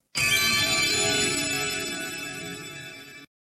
Sparkly Sound Effect

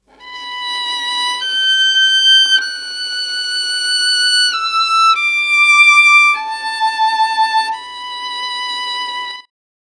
Saddest Violin 8va

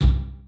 Kick

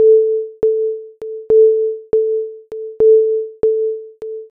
Alarm

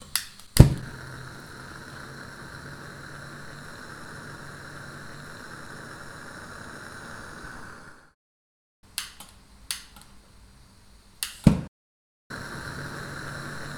Gas Lantern Lit Loop

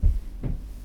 Stomp

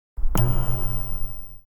Off Hologram

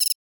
Cricket chirp synthetic with rasp

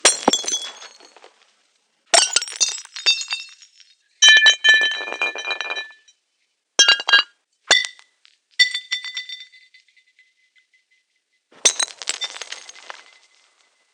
Breaking Glass And Ceramics Processed

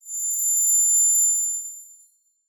Ear Ringing Pain High Frequency Warning

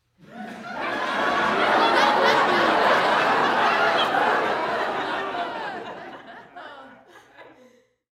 Short Laughter Staggered

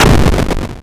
Explosion

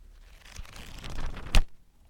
Flipping Through A Book

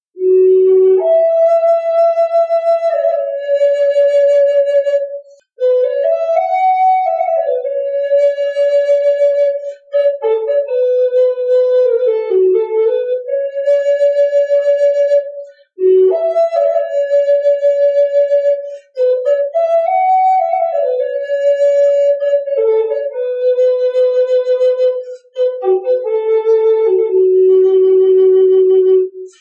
Native American Flute

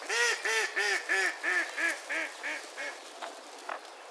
Derisive Duck

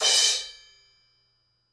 Crash A Custom 18 Choke

